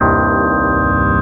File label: synth organ, dx7